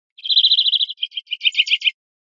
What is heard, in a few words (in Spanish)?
primaveras, verderon